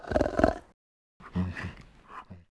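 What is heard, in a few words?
Bear Fidget Vox; Civ4 warlords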